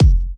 Kicks, Elektron MachineDrum SPS1 MKII

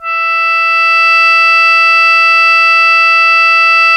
PRC E, SHO PERC PAD; Best Service ProSamples vol.55 - Retro Sampler; AKAI

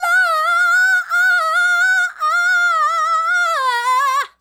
Female Solo, Hip-Hop and RnB Vocals

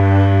Jingle loop; SNES; Romancing SaGa 2

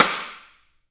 STICK, Drum 1 Dry; East Collexion

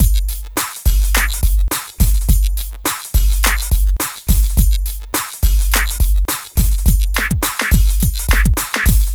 Club Tip, R&B Drums

